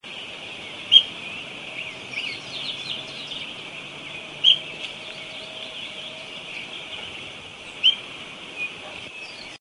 voz suirirí amarillo

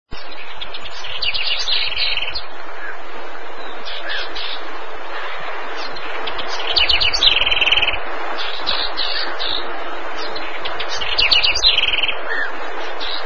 voz ratona común

